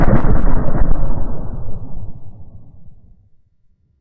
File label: Huge explosion from bunker; Hovl Studio; SFX Battle sounds; WhiteMan Unity2D